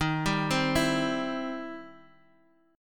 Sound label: E Minor Major 7th Sharp 5th Eb